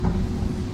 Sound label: door Move Start, Metro